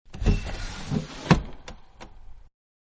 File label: Window Open; Windows; Household; Window 1-Open